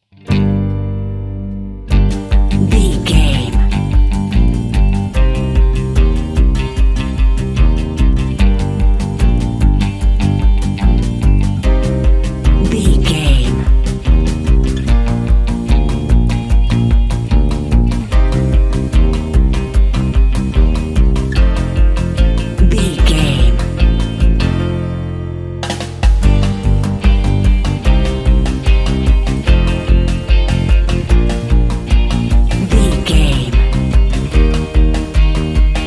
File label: modern european folklore dance bed